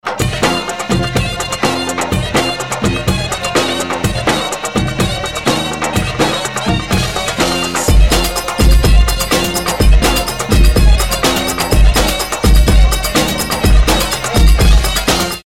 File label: Mizmar Balady